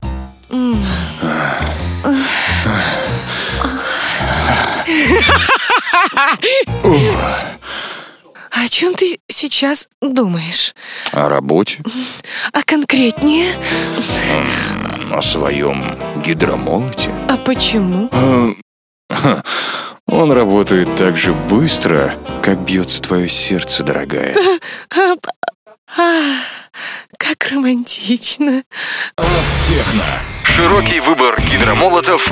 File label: techno trash